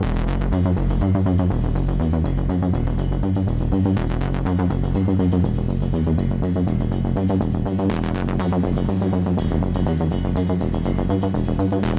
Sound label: Music Tension Pulse Med Tempo; Battlefield 3